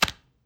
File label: throw down, cards; aliens